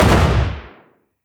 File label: Frag Grenade 40mm Shot; Weapons